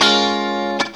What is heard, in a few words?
GTR LICK, Hip Hop; E-MU Formula 4000 Series Vol. 1; Hip Hop Nation